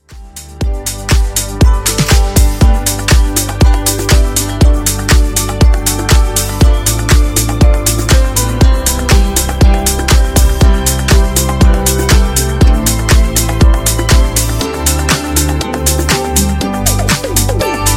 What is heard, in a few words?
Funk Bootleg 120Bpm